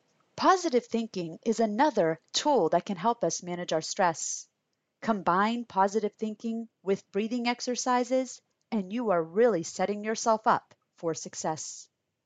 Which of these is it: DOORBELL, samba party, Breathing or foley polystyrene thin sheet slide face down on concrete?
Breathing